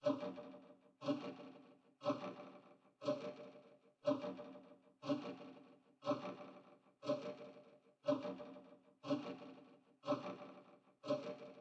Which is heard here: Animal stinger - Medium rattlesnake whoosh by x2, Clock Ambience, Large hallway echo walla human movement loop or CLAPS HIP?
Clock Ambience